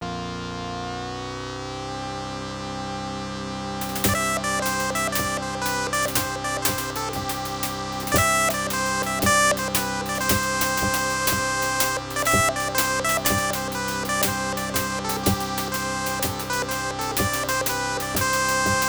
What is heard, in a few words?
Playing Bagpipes; commander keen in goodbye galaxy episode v the armageddon machine ibm pc at; Nearly Kilt Me!